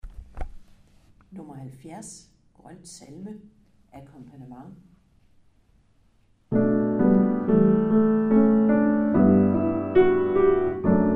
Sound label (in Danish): Grøn salme, Akkompagnement